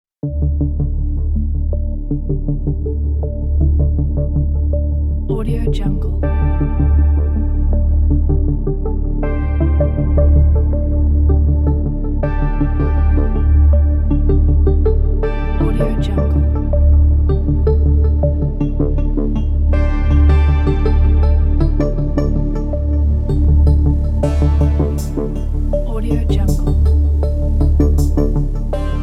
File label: retrofuturistic hitech background